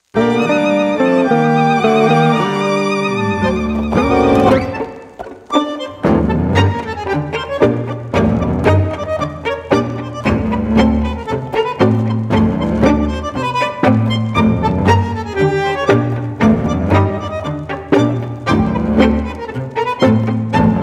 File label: tango Music aged